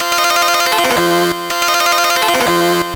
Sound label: beatbox; pinball dreams; Blaizer